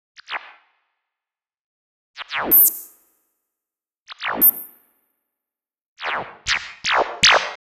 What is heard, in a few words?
Atmos FX, Atmosphere & FX; Touch Loops; BlissedOutBeats